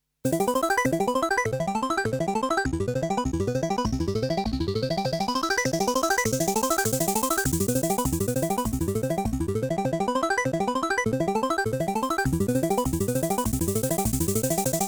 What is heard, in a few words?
medusa dry